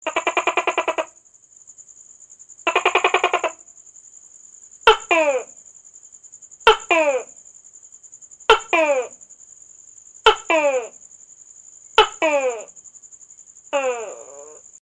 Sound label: A Wall Gecko